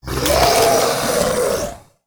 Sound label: Skeletal Flayer Death